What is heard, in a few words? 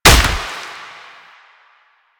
Proc Explosion; Mods